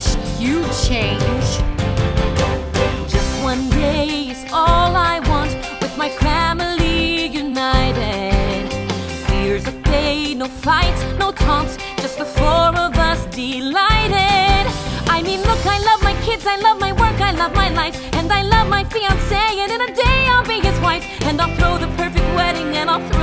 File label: Vocals